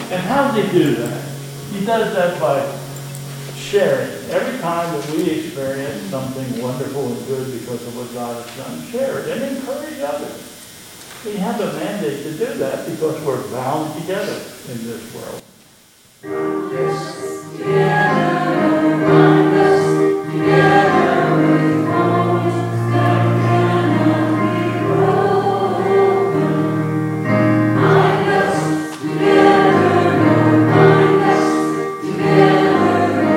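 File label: Closing Chorus, church service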